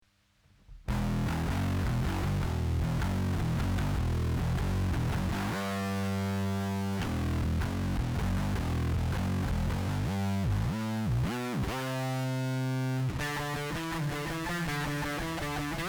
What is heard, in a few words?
whammy disto